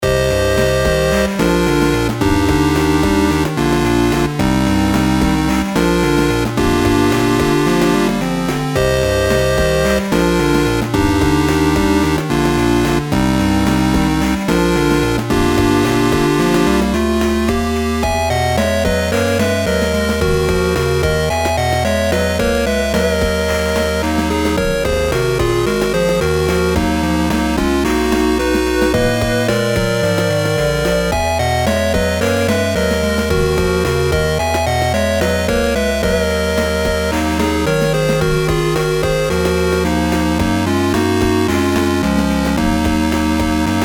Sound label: Game Over; Toriel